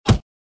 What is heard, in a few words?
Body impact baseball bat